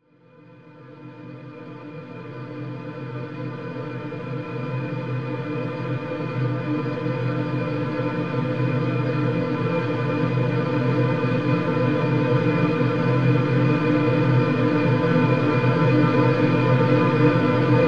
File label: gtr FX